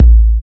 kick sample A key, kick drum single shot tuned to the A note, Loudest frequency: 72Hz